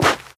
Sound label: scuff, footsteps, player, carpet